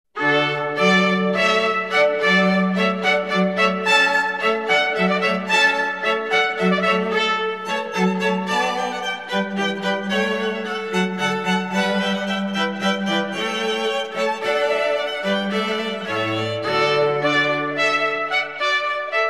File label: TRUMPET Hornpipe